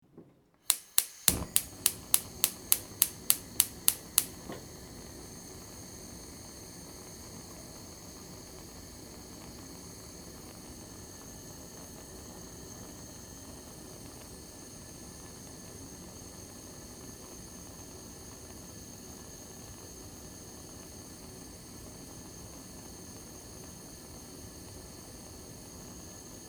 konforka, zvuk gazovoi plity

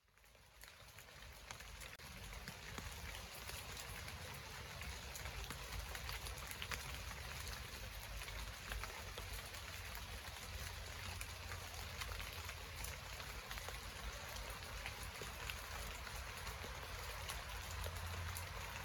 zvuk osennego dogdia po listiam; шумом дождя, падающих капель

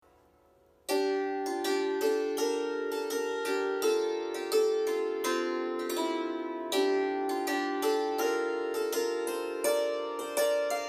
cymbaly

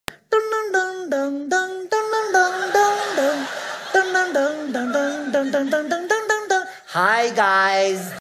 donaty

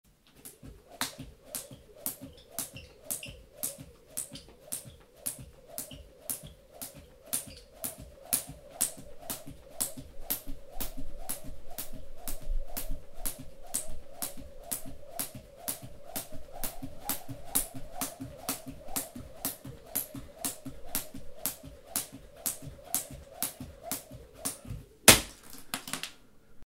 skakalka

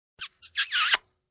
pocelui

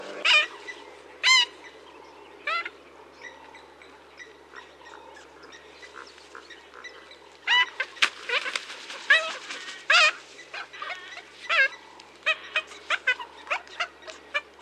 ibis sviashennyi

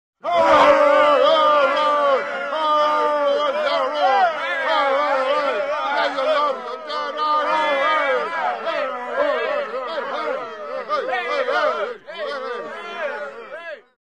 zvuk nedovolnoi tolpy fuu; Негодование сборища людей

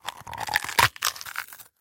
zvuk ukusa

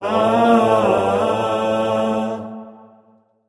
zvuk peniya

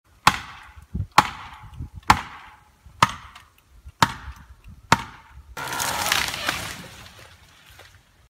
stuk topora po derevu i padenie

